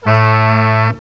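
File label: sax section; CMI Sound Library Rev 1.3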